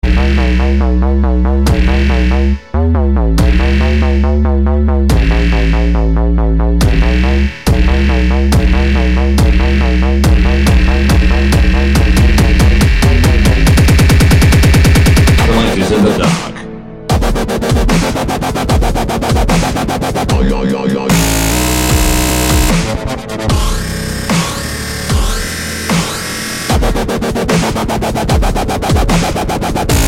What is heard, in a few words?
Dark bass; DidJaws